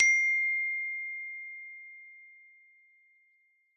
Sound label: iphone x note ringtone